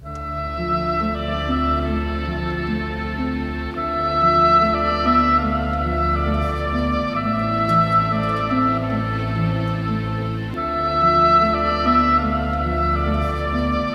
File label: flute, Loops, Sad